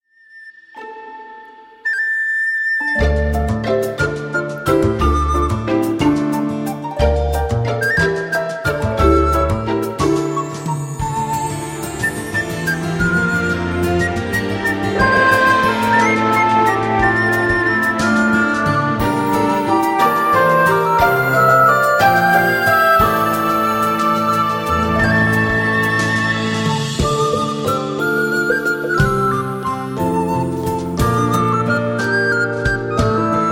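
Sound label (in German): Eröffnungsmusik Playback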